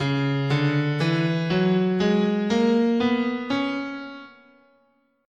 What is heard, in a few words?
C sharp Phrygian sharp6, scale